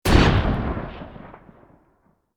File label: Bullet Time; FXHome; Bullet and Gun